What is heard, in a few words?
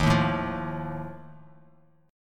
Eb Suspended 2nd Flat 5th, Bass Standard; Ebsus2b5 strummed; JGuitar